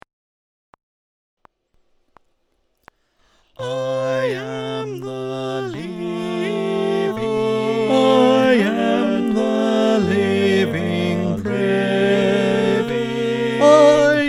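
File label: Tenor, click track, choir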